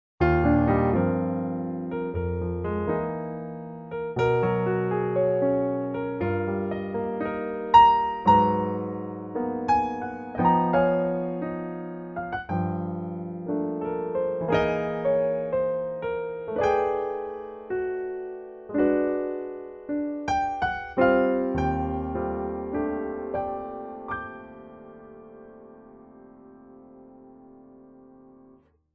under lid

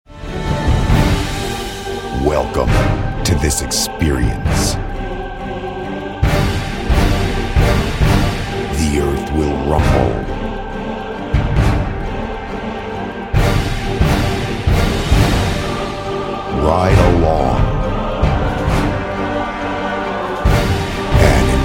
Epic Promo

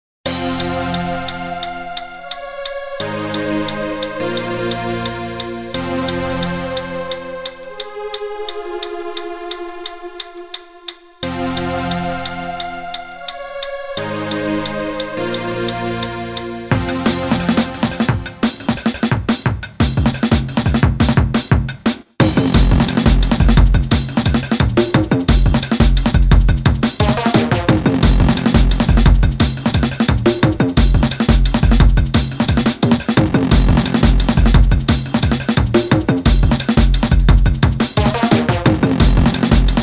funked stakka

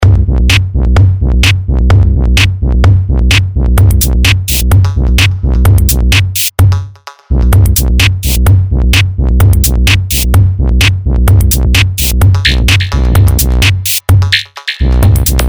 bass

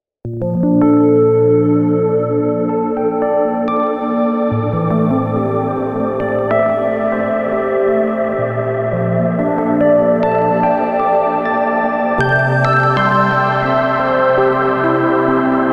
electric piano ballad

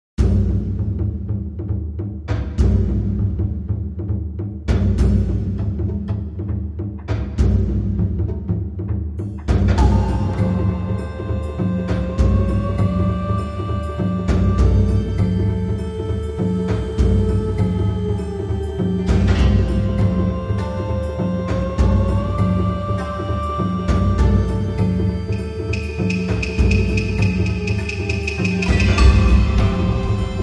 Taiko; Etenraku

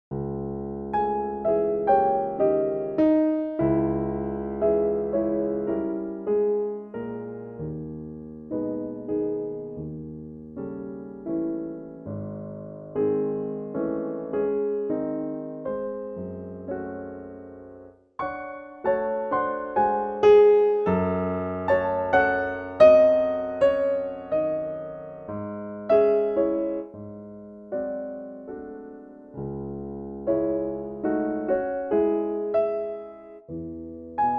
Steinway, Prelude; Scriabin

